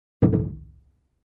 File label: Fuel Cap Close Interior